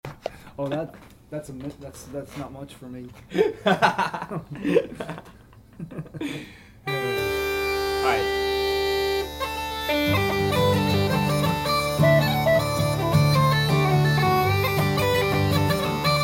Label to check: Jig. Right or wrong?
Right